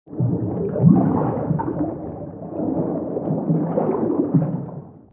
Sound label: Large aquatic dinosaur underwater movement